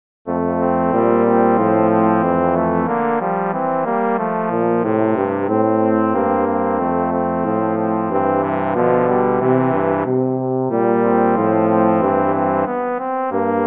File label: Melodie